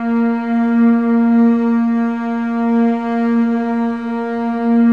A#1, Contrabasses

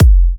F, kicks; markus hakala kicks

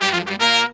Pop & Funk Brass

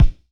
Pound Raw Meat, Kicks; Airwindows

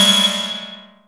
CLANG

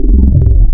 Vowels, wavetables; Animoog

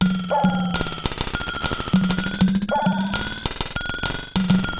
Digital Delay RX17 (100BPM), cyborg beats

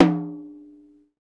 TOM TOM HI; Club-50 - Foundations Roland; Ext. Snare 4

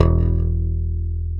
Slap E1; Mo' Phatt; Digital Sound Factory

